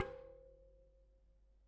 Bicycle Spoke

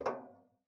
Ping, Kitchen Toaster

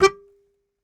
Very Short Note, Melodica

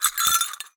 Cowbell Chain, Percussion

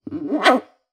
Classical Guitar Extended Technique

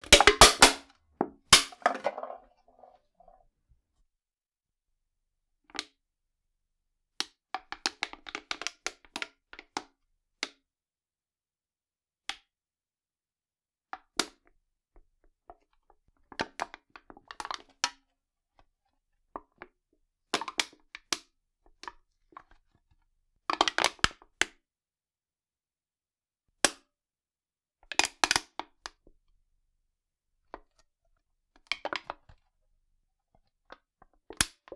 Can Crush, Drinks Can

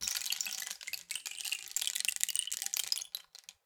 Rain Stick, Percussion Samples

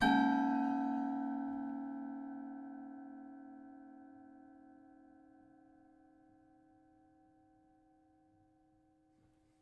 Prepared Piano

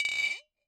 Bottle Struck

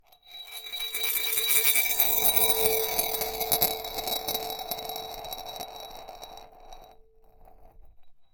Marble Spin, Large Wine Glass

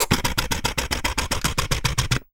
Camera Sequence

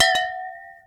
Water Clink, Glass Clink In Water

